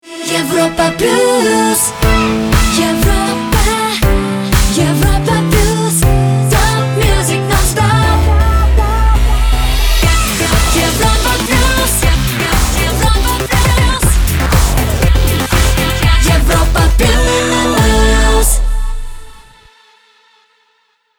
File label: Jingle Main ID; Europa Plus